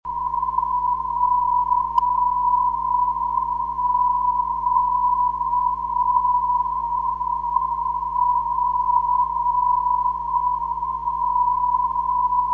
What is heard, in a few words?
pq 522kHz, ndb